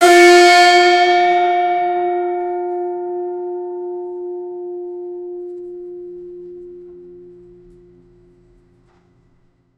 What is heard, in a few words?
string played on high, guzheng